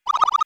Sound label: head shake; SNES; mario; SMRPG